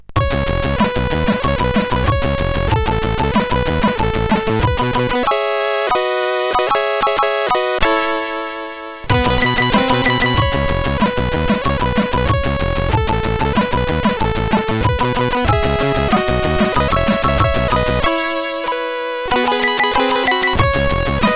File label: C Moll sid CSG8580R5